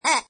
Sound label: Cartoon Silly Horn Honk, comedy duck; Comedy/Cartoon; Animals